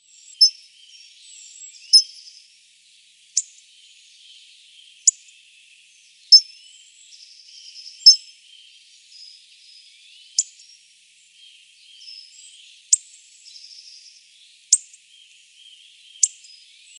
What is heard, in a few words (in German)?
Stimme des Kernbeißer